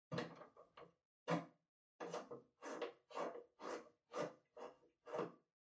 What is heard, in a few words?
TV Hardware Far Antenna Cable Plug In